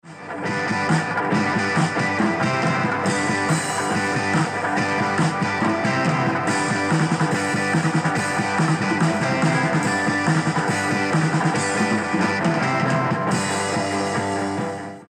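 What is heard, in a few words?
rock party mode, 搖滾/派對模式, 2.1聲道喇叭; BenQ 2.1聲道喇叭; 螢幕喇叭; 遊戲模式; 增強聲音定位，以便您可以識別細緻音效的位置或來源，例如飛行的子彈或敵人的腳步聲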